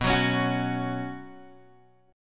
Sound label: B Suspended 2nd, Guitar, Open G